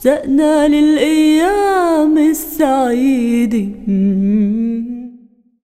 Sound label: SINGER, ArabianTraditions; USB Soundscan vol.43; AKAI